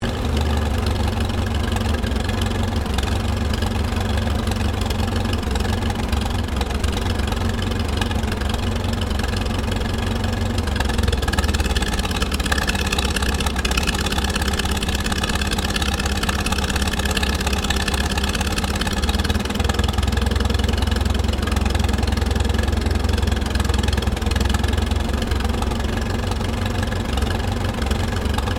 Tractor McCormick Deering W12 1937 idle, Old Farm Tractor; Tractors; Vehicles